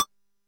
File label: key collect; Items & Pickups